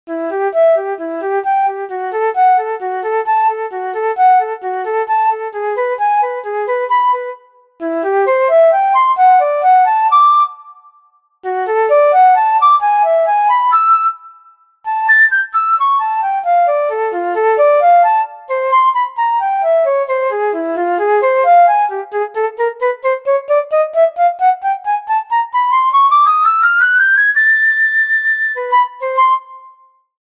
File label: Flute Sustain Vibrato; Woodwinds; Syntheway